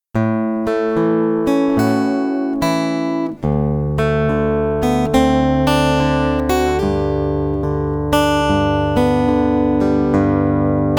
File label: instrumental